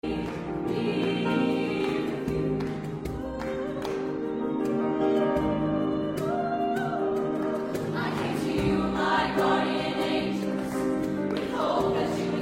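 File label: Chamber Singers accompaniment; choirs auditions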